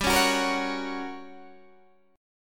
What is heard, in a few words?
G Minor Major 7th Flat 5th, G, down, Guitar, Standard; strummed; JGuitar